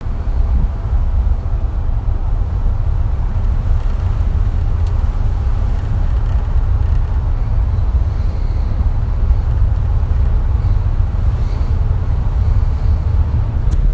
squealing when i hit 1500 rpm; discovery ii

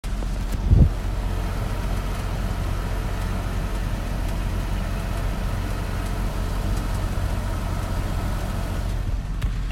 odd engine tick, random engine tick; lr3 4 4

